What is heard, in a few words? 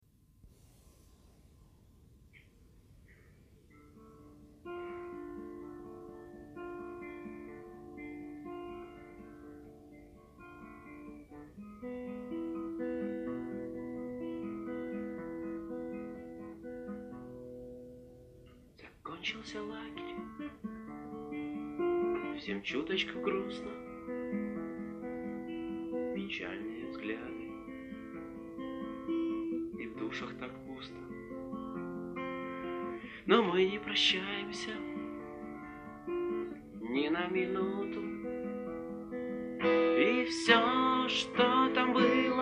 unplugged